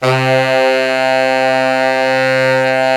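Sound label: brass, Fat Pop Section; Roland L-CD702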